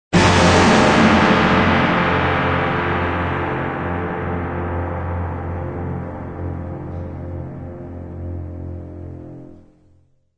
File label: Music Surprise